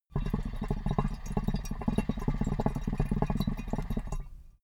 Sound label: water pipe puff bubble long